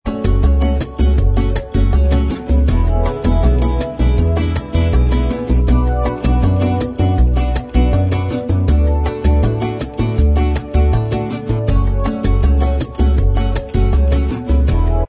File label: bossa mix Inst Track